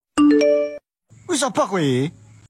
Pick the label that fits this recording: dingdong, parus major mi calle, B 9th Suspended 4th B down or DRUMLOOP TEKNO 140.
dingdong